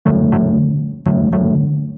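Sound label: notification message asus rog phone 8 pro; tin nhan thong bao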